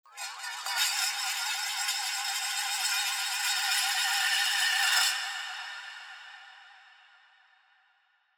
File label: twirl